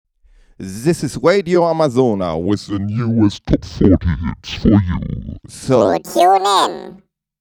Zoom V6 Vocal Processor Formant

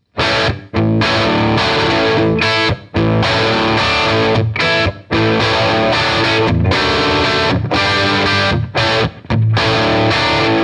VOX Cambridge 50 AC30TB Modeling

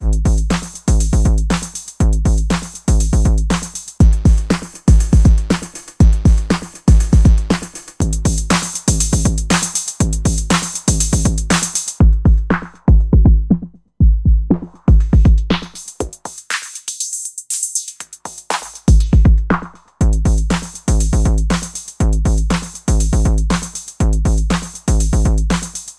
Roland TR-06 Boutique Simple Beat Overdrive Types

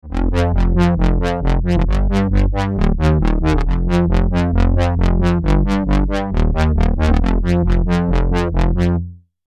Bass; Xerxes

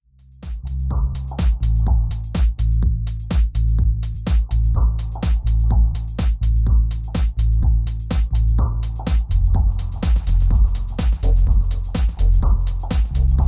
Echo

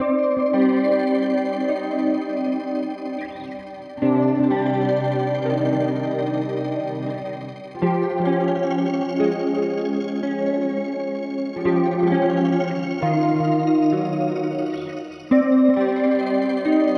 Beebo Granular Modulation; Poly Effects

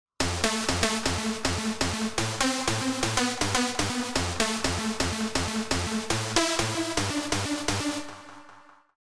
Percussive Supersaw

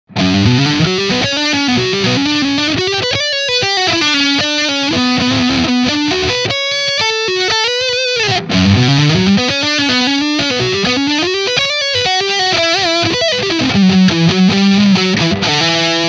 Preset Metal Solo

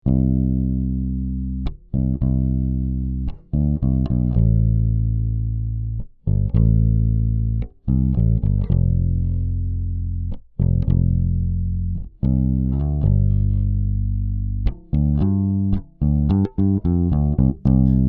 Sonic Farm Creamer SE Test Bass DI

